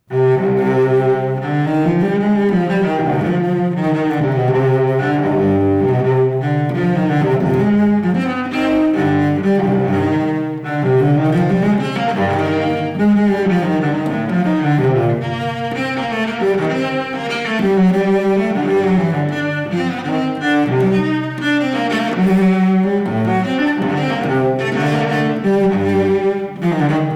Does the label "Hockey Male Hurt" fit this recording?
No